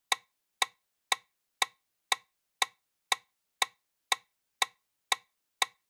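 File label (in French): métronome 120 bpm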